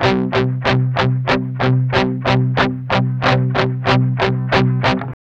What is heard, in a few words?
MED ROCK 8TH C#3, Guitars; Spectrasonics; Hans Zimmer Guitars VOL-1; 102-MED ROCK